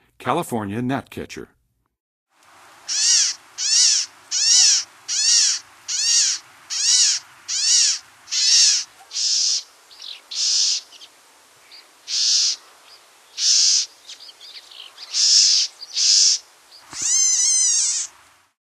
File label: California Gnatcatcher, Songs of Birds; North America; Western USA; Western USA Songbirds